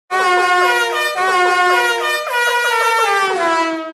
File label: horn